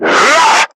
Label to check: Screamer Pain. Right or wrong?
Right